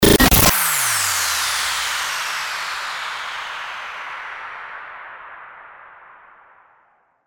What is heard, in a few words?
STARTER WHOOSH